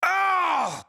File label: AHurt